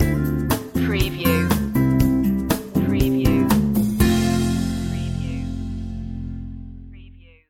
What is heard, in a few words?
a cheerful morning stinger